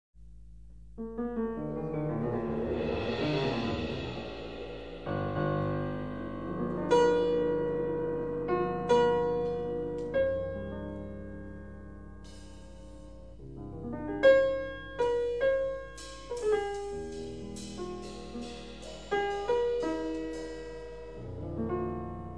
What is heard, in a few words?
folk tune